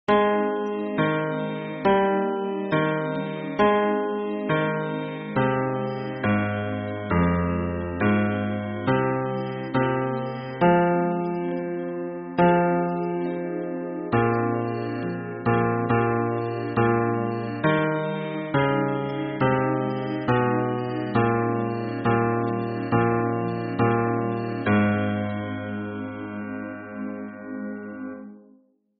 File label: hymn bass